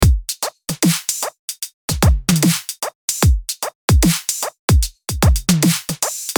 Dubstep 150BPM

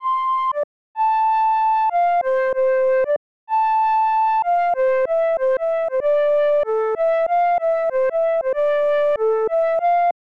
Melody Lead Jazz; midi wizard 2; Unison

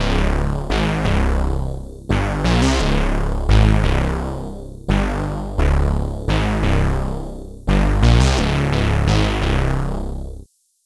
Bass

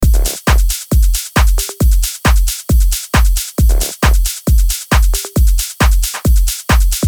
Techno Melodic Techno 135 BPM

